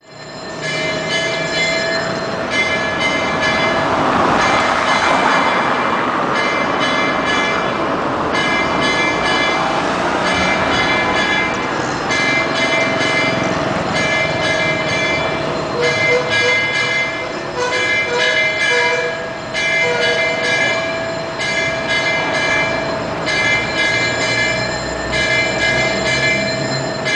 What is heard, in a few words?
sri mahamariamman temple bell